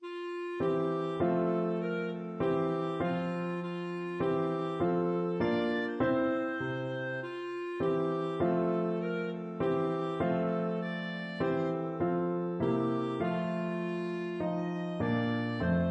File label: clarinet; baloo lammy